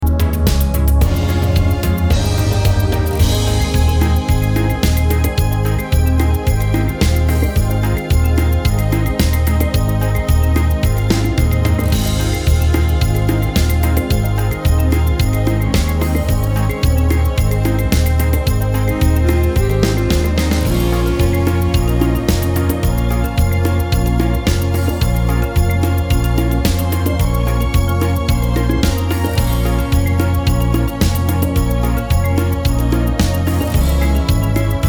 vers cumbia

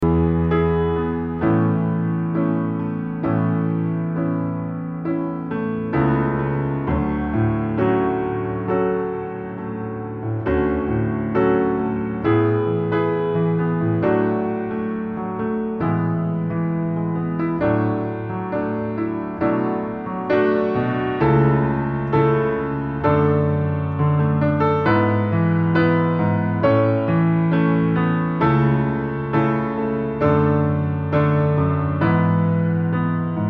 solo piano

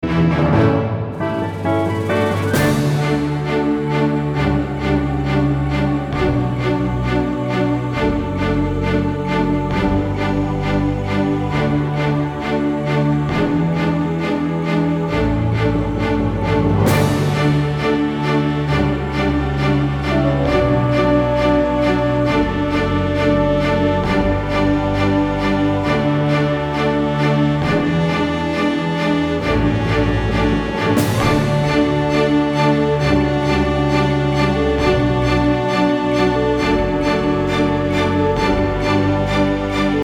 orchestra; orchestra scala di milano

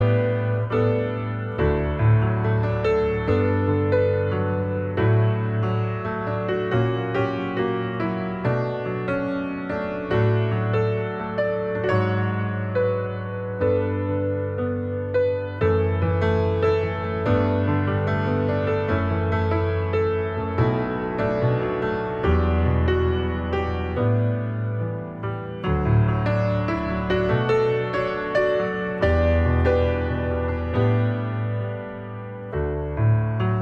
vers solo piano

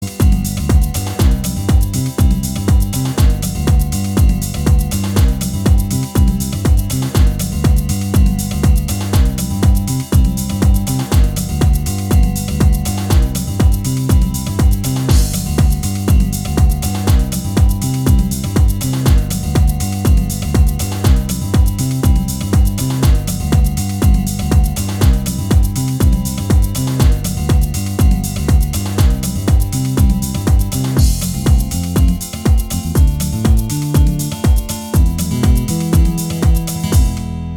per chitarra

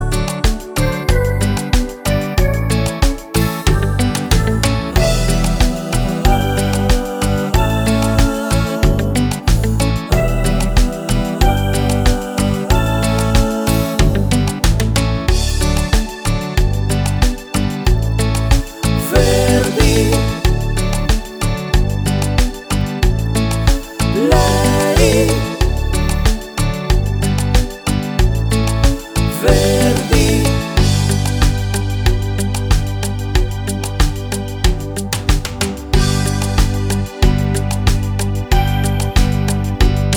cumbia

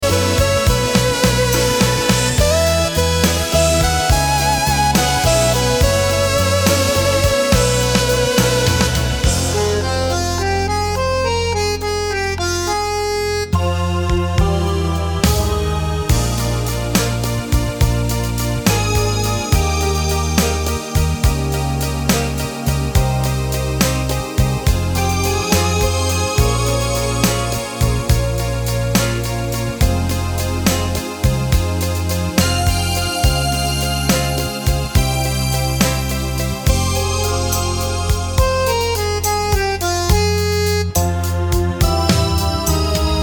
terzinato

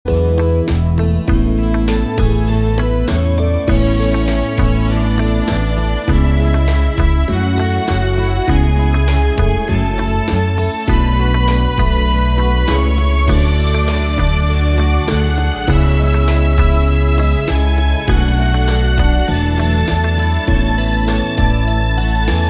rumba

